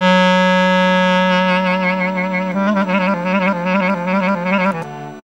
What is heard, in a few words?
CLAR, Gipsy; Best Service; Ethnic Journey Sound Cube